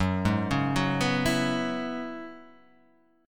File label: F sharp 7th Suspended 2nd Suspended 4th F sharp down Guitar Half Step Down